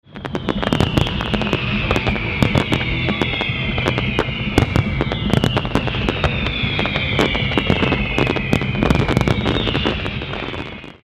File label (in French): feu artifice saint malo